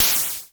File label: NOIZ XMOD, Klone Dual-Percussion-Synthesiser; KLoNe MONO; 300 Drum Machines